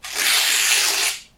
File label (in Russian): razriv bumagi